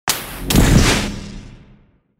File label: Thunder element